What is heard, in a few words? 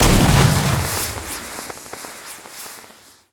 flair, firework